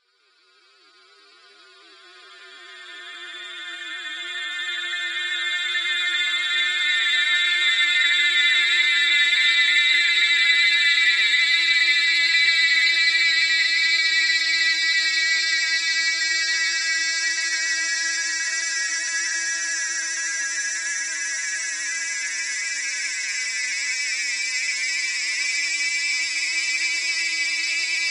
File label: Sample Upper Shimmer; SoundChaser; CerebralAudio